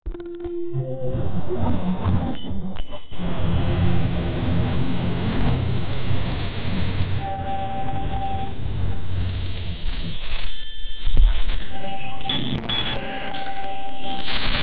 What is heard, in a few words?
bassy notification sounds; advertising